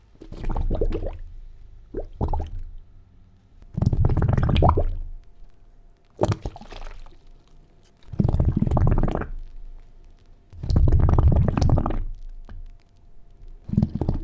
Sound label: water bubbles